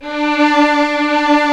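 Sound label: strings, 8pc, vibrato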